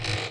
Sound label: attack bow